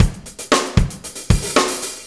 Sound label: Drum Loops; Drum Tools; Lemon; Sonic Foundry (Sony Creative Software); Various Loops